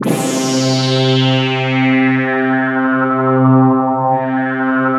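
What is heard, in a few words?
SYNT -L, synth, Drum & Bass, 160 BPM; Ueberschall; Drum & Bass Resonance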